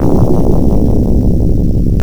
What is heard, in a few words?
Earthquake; Sword of Vermilion; Genesis